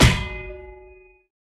Hit Spade Human

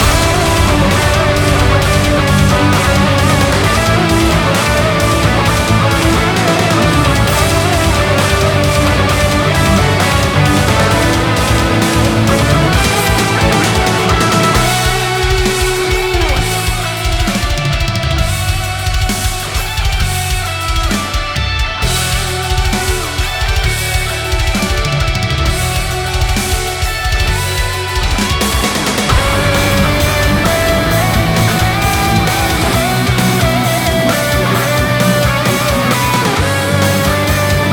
Boss B Loop

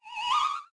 Corn

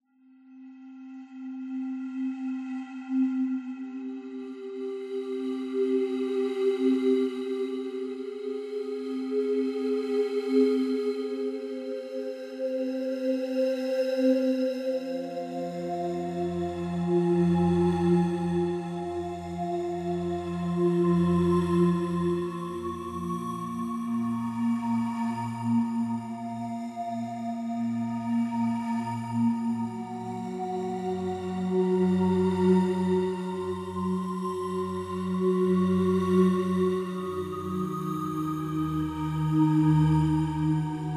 Scary Theme; Paws and Soul

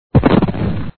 Cannonball

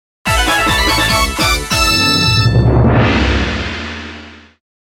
Fanfare

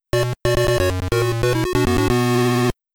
GAME OVER (PC-8001); New City Hero

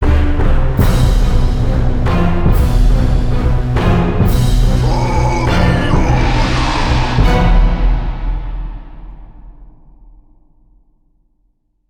Menu Win; Mason; chivalry medieval warfare 2012 pc

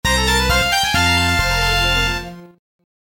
GP and VS Fanfare; mario kart zr ds gamerip 2014

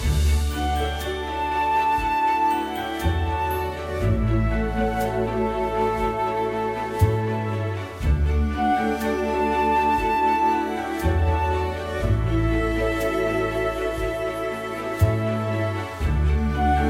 music ingame; farm heroes super saga; android

